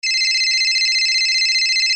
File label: Land Phone